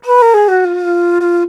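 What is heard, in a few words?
bansuri, World Winds; E-MU Producer Series Vol. 7 – Old World Instruments; World Combos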